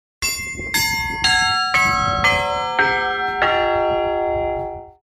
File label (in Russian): 7 kolokolov; Dolzhanskaya